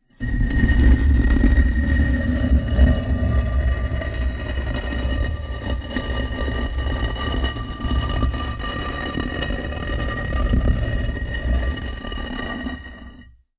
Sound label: Heavy Stone Grind; Guterton